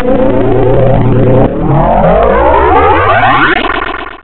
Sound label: BLACK HOLE, black hole absorbing matter